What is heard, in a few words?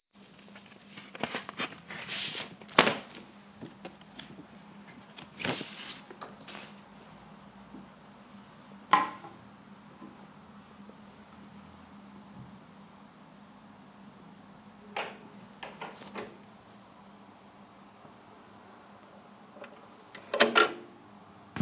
glass film